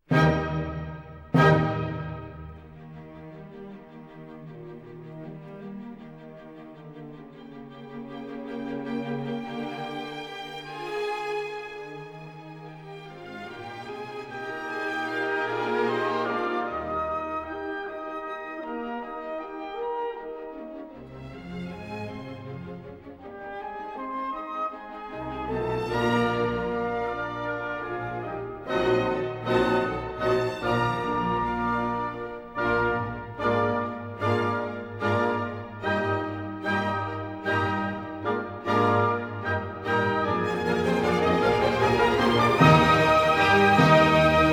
Symphony No3 in E-flat Major 1st movement; Ludwig van Beethoven; Op55 (Eroica)

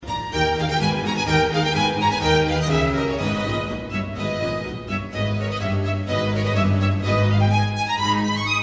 Violin Concerto in A minor Presto; Antonio Vivaldi; Op 3 No 6 from L'estro armonico

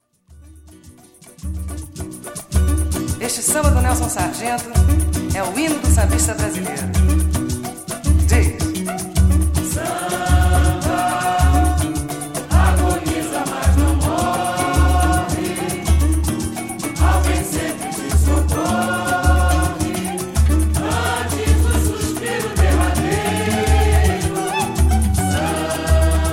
Samba

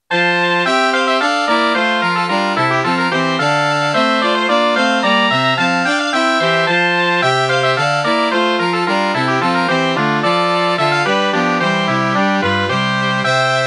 hornpipe midi mix

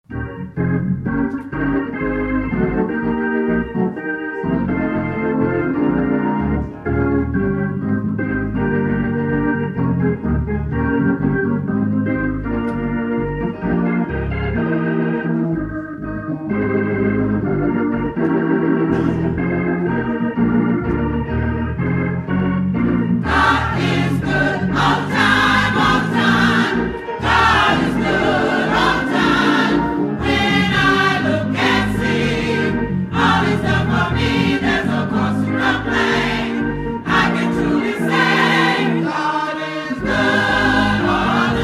African American Gospel

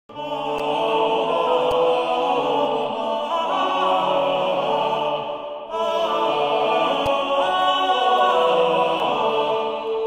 organum quadruplum; Perotinus; Viderunt omnes

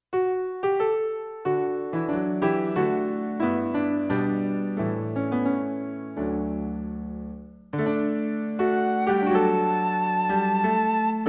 Come Again Sweet Love (D, low) piano acc.

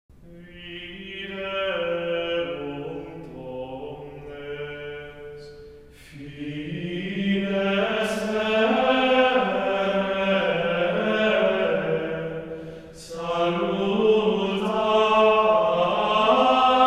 Gregorian chant Mass, Communion; Mass for Christmas Day

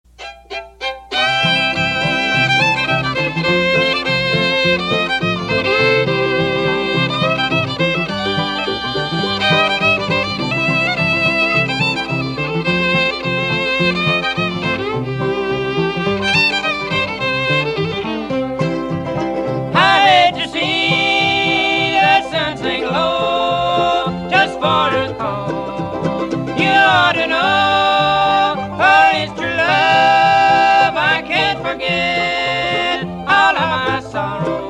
Bluegrass

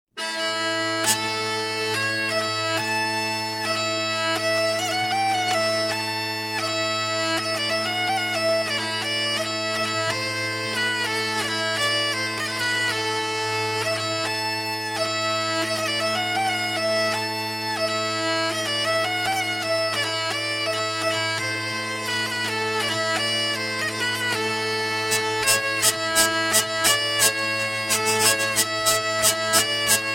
Hungarian Hurdy Gurdy

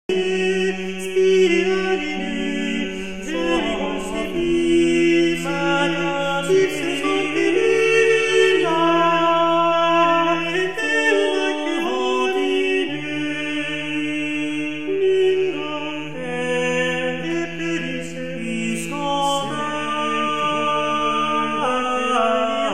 motet; Philippe de Vitry; In arboris-Tuba sacre fidei-Virgo sum

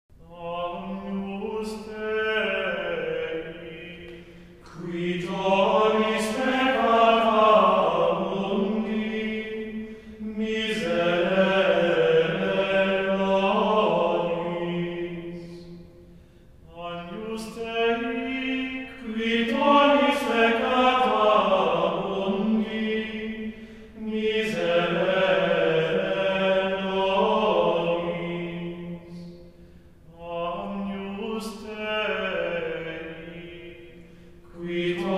Gregorian chant Mass, Agnus Dei; Mass for Christmas Day

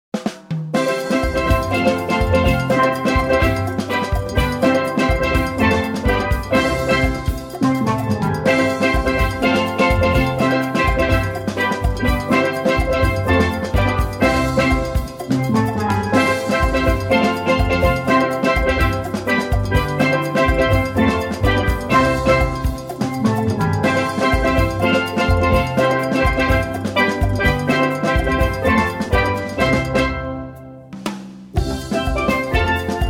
Steel Band

